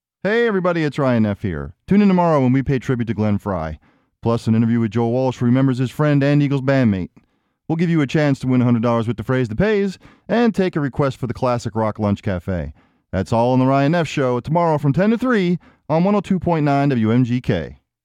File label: music